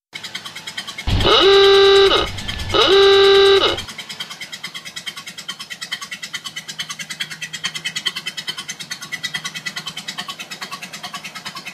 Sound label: ahooga Ring, Horn